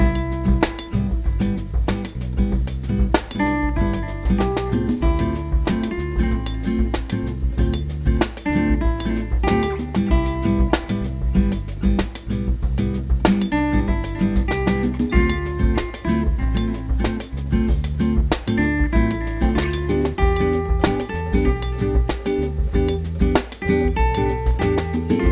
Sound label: Tropical Relaxing Loop